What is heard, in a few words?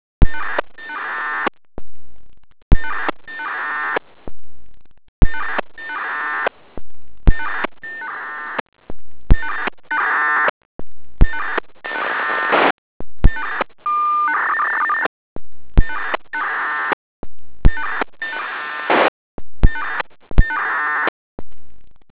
signal